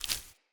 Grunt Foley Jump; Enemy; Characters; Corrupted Sproutfolk

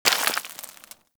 Bullet Impact Debris Rock; Warframe

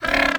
Bird Three Chuff; Warframe; Entrati

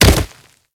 Kubrow Land Dirt, Footsteps; Enemies; Warframe

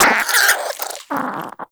Nexifera Vocal Tranquillized; Hunting Critters

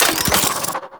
Mech, Spear; Warframe; Tenno; Priest Spear; Scourge Prime

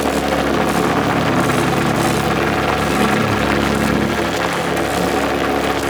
Super Weapon Ship Laser Canon Beam; Gameplay; Warframe; Grineer Railjack POIs

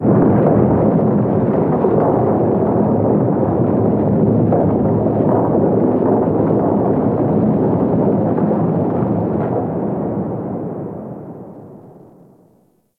Ice Distant Ice Break Large, Ambience; Warframe; Corpus Ice Planet

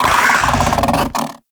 Combat Vocal Attack Medium; Warframe; Catbrow

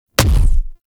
Punch; Warframe; The New War; Weapons; Omicrus

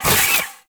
Kick Whoosh; Warframe; Venus; Venus Terra Moa; Abilities

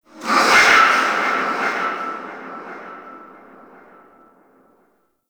Stadium Infested; Ambience; Warframe; Nineteen Ninety Nine

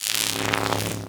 Arc Rifle Fire Random; Infested

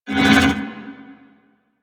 Void Portal Rotate One Off

